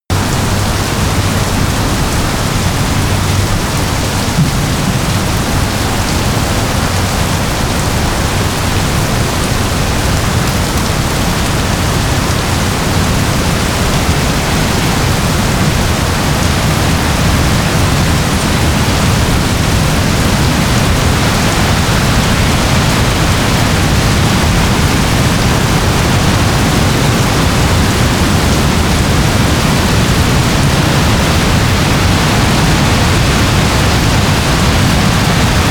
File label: rain